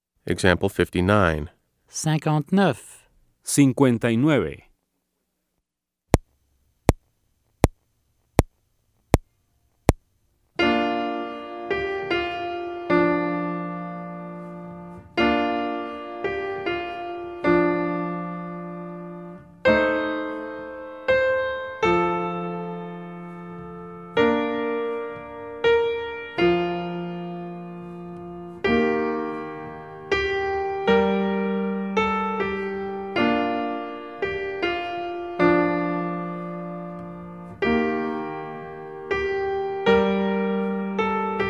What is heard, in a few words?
Keyboard